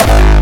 Hardstyle G

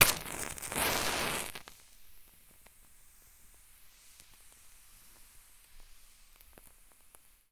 Match Ignition

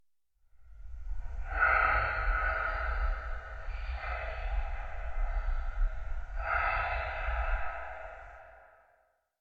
Acoustic Breathing

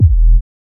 Kick Drum Deep Harmonics

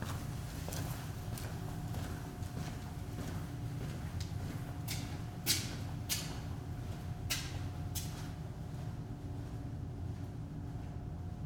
Walk Basement

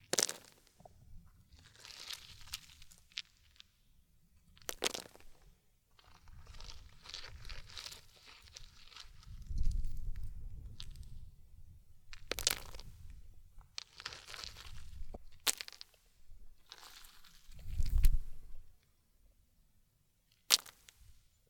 Rocks Falling On Ground

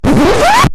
Record Zip

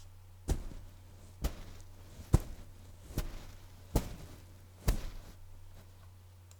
Steps Grass

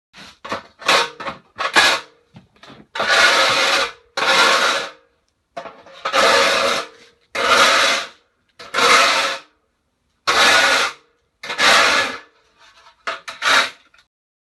Metal Scraping On Concrete

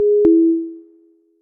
Intercom Out

